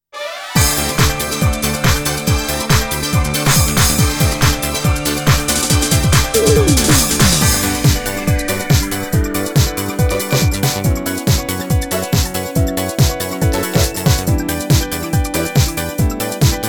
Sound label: (Karaoke Ver.); otomedius g